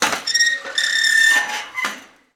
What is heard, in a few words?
Gate Metal Fence Open; Doors